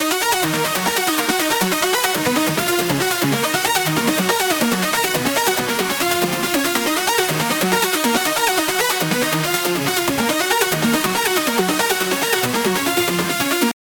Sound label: supersaw, complex, epic, arp, D# minor, 8 bars, 140BPM; Audialab EDM Elements